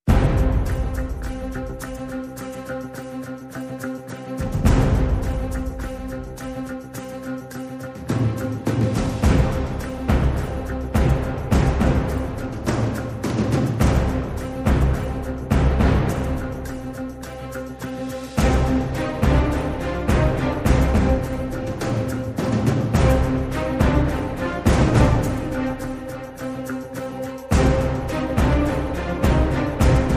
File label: No Brass